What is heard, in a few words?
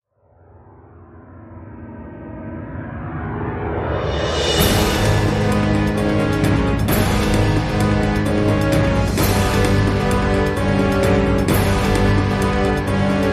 dark victory